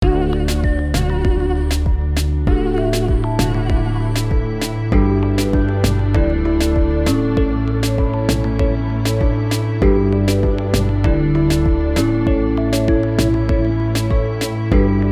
GOOD NIGHT SLEEP TIGHT (INSTRUMENTAL)

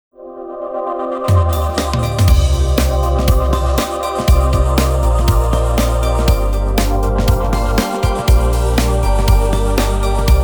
TENSION BELLS VOICES

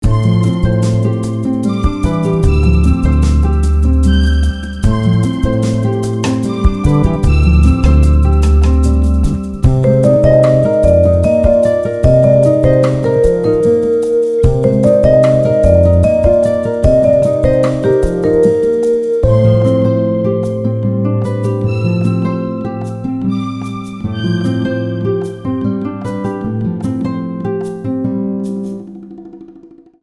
GENTLE NIGHT WAVES

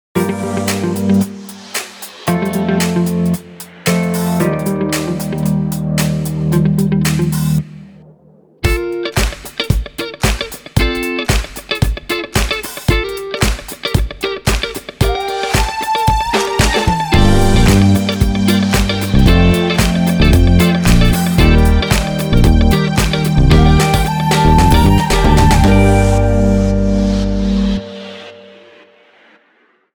instrumental

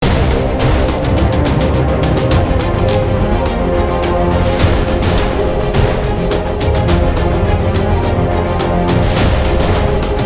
Warewolf Attack Trailer

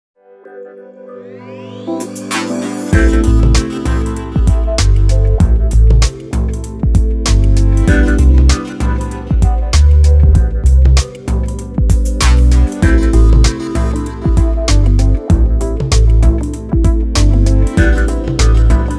(INSTRUMENTAL)

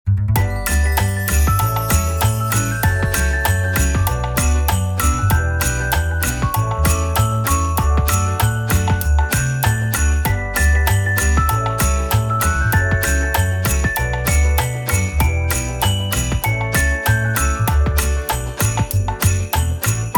ELECTRIC WINTER SWING

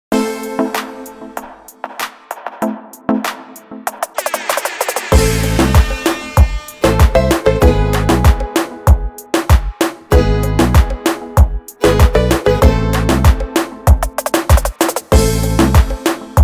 instrumental

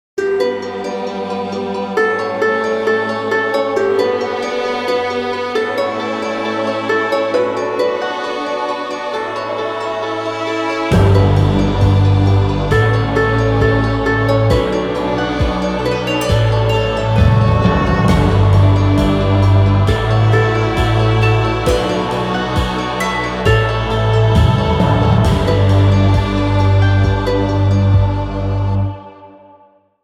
HIRAETH LONGING INSTRUMENTAL; welsh language singer songwriter acoustic folk

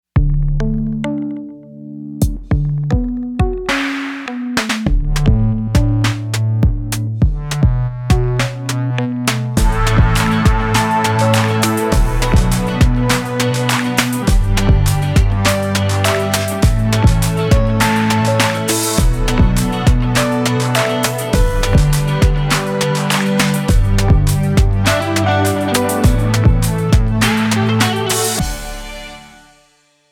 ONE ONLY (INSTRUMENTAL)

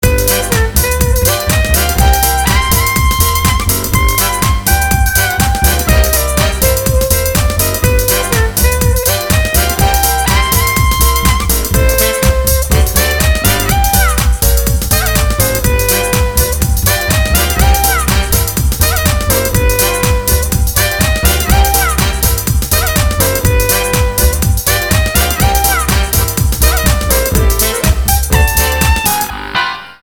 ELECTRIC SWING THING

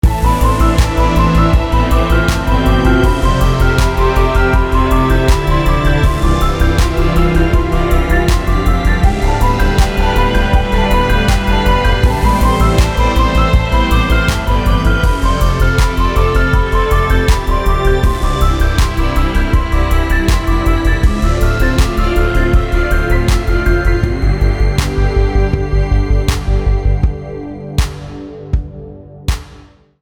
STRINGS FOR THE INTROVERT

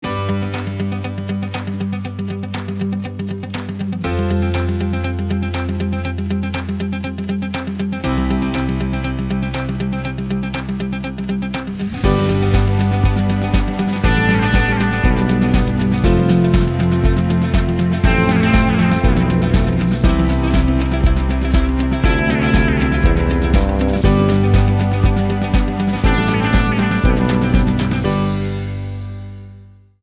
ELECTRIC GUITAR INSPIRATION

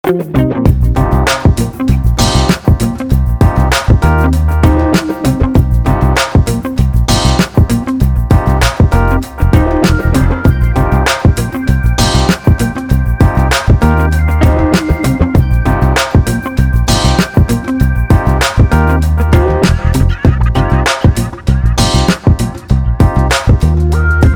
OLD SKOOL CHILL

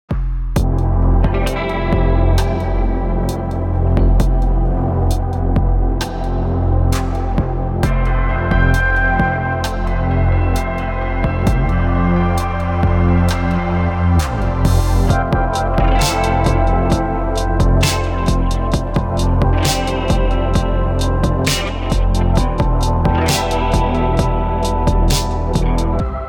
I DONT WANNA SAY (INSTRUMENTAL)

